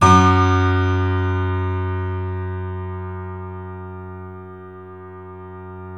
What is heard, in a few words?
F#, The Piano; InVision Interactive - The Piano; YAMAPLAYHARD